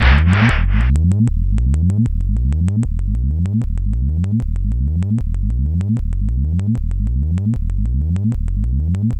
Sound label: ELECTRIC, Special FX Twisted; USB Soundscan vol.14; AKAI